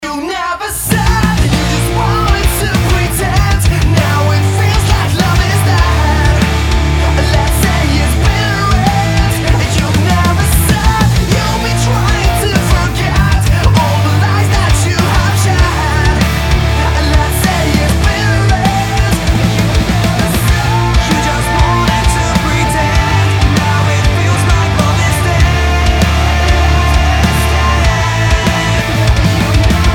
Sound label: MIX MKIV REAL